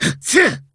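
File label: side punch, Japanese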